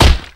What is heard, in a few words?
kick